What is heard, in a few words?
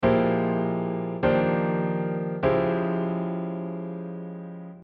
polychords